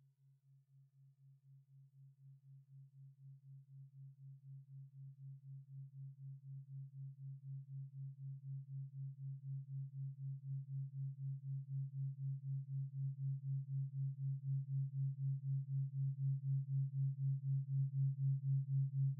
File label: Raga Bhimpalasi (136.1Hz), Meditation binaural; Binaural Hybrids